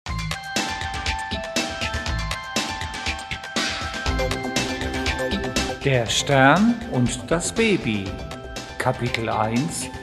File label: Jingle Mix